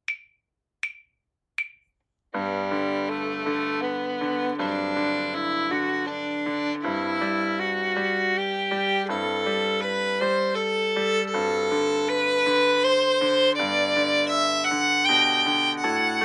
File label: Etude with violin; The Violin Fun Book (Level 2)